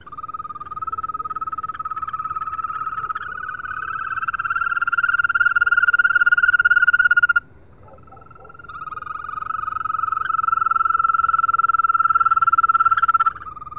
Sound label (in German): Wechselkroete